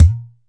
ROLAND SPD-20 PERC TABLA

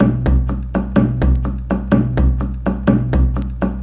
62 bpm tribal

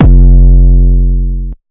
Clicky Beginnings 808; Khezie 808s

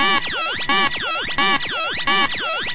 087 groove alarm; SYNTH AND ELECTRONIC LOOPS

